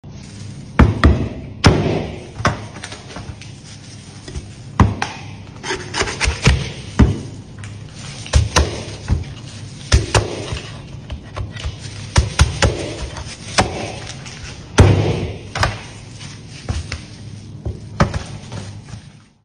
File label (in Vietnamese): tiếng chặt thịt